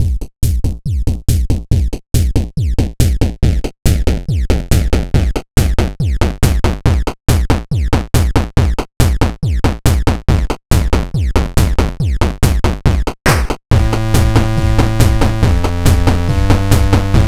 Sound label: song